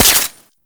Mech; Warframe; Fulmin Prime

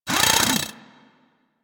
Drill Punch; Warframe; Grineer Ghouls; Ghoul Drill Sergeant; Attacks

